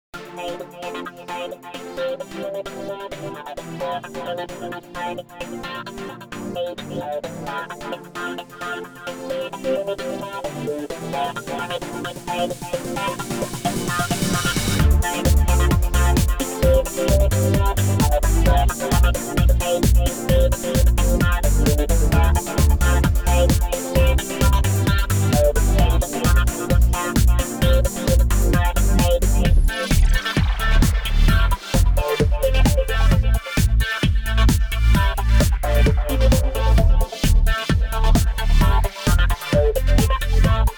Radio Electro; Gangstar West Coast Hustle; Android

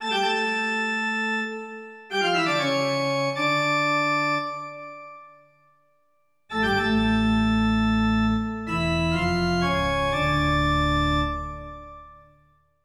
Stereo - Cutscene (Stinger; SpongeBob SquarePants Lights Camera Pants windows; Dirty Bubble Trouble

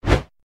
Swoosh; Infernal Radiation

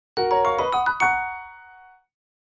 Get Fanfare (Item); The Legend of Zelda Breath of the Wild

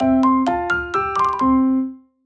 Jingle Menu End; clubhouse games 51 worldwide classics 2020 switch gamerip

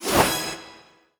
Master league Medal Gain; Angry Birds

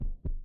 Heartbeat, game music

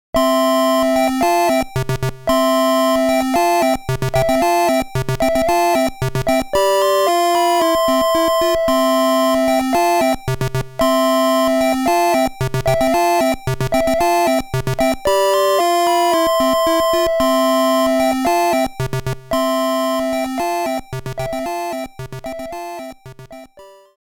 Game Over; Touhou Kenbunroku

